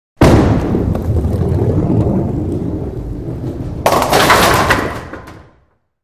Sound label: Bowling Ball Rolls